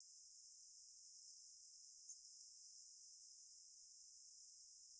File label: Blackpoll Warbler